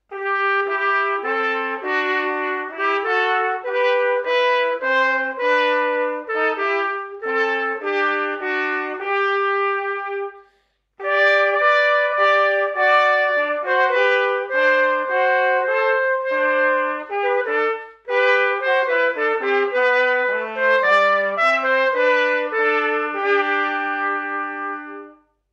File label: God Save the King performed by Royal Army Band; HM Royal Army Band